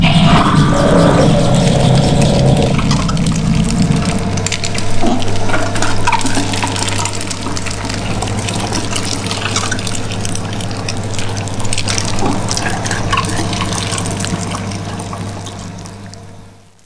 grinder; ambience; caged

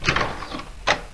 door move; doors; aomdc

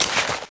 m4a1 deploy; weapons

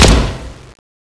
saw fire; weapons

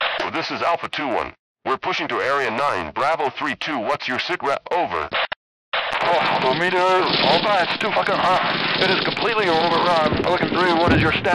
radio; ambience

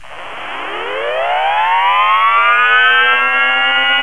vats chargeup; caged

